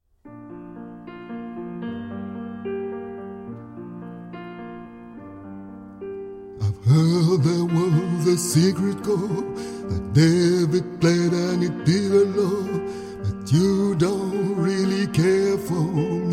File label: Hallelujah Bass Piano